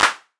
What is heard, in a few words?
clap; boom bap modern